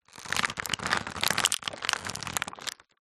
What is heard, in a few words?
foley plastic thin casing packet squeezes handling creak; zapsplat